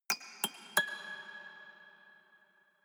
haunted strings tinkle sequence slow short

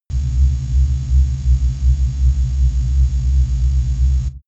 space alien machinery

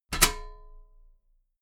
metal ladder step heavy

